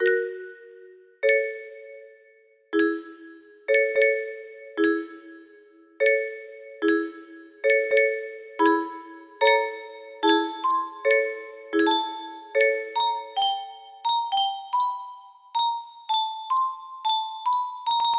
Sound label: Music Box room music box melody